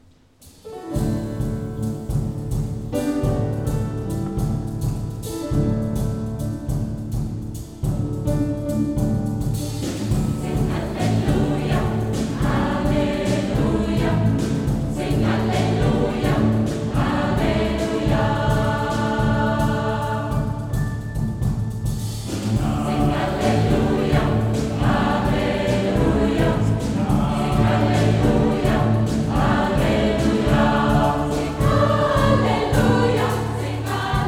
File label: African Alleluja, Gospelchor